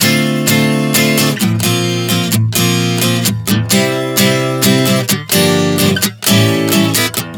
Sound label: Dm7-Bbsus9-Gm-A7 130 bpm, acoustic guitar chord progressions